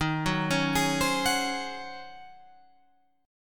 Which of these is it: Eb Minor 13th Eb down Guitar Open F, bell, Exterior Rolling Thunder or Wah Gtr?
Eb Minor 13th Eb down Guitar Open F